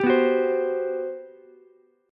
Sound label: G Major 11th, Ukulele C6; strummed; JGuitar